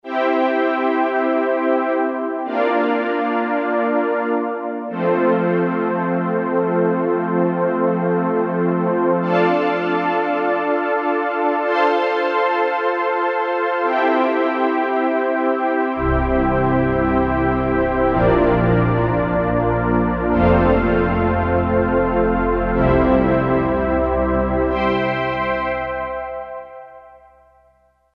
JUNO PAD; Akai SG01v

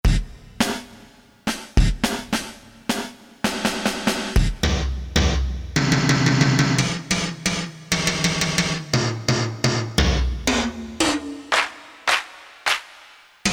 DR-kit, Drums, Korg DSS-1; Drums III

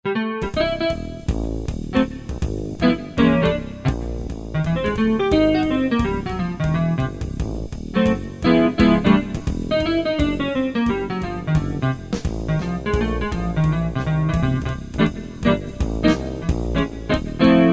Jazz Quartet, Kurzweil K2000; Kurzweil K2000 V3

